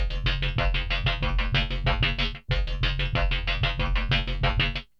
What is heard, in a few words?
FUNKY WHA 94, Trance; AMG; Global Trance Mission VOL-2; PROCESEDLPS